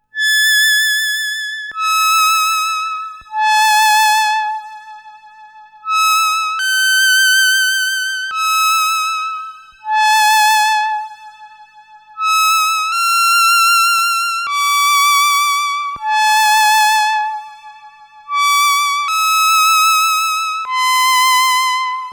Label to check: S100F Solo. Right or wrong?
Right